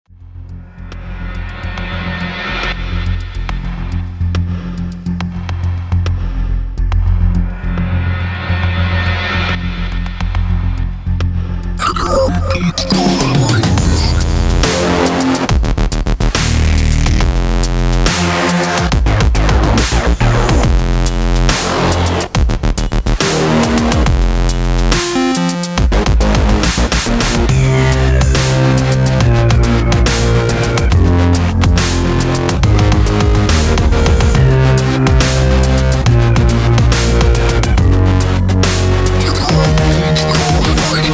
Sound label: The Drumbeat Goes Like This Dance